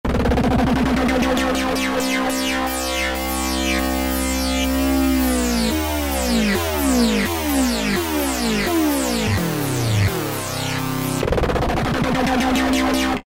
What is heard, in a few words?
Korg microKORG Stutter; Factory Voices